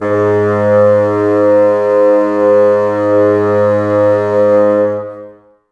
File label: Bassoons G#2; Miroslav Vitous - Woodwind Brass Ensemble